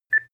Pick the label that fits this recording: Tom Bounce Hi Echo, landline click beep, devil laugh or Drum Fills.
landline click beep